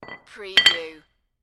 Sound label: Dumbbell Set Down; Sound Effects Bible